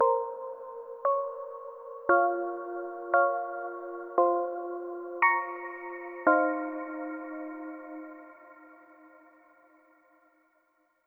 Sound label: Overlay Tone; The Shard